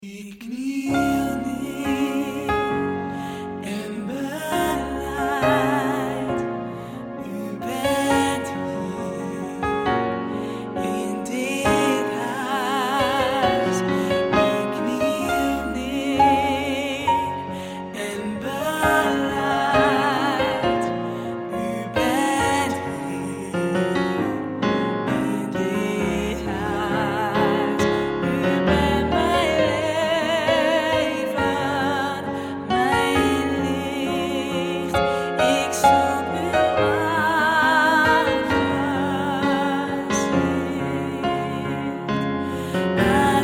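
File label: tenor; Malieveld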